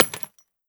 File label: shell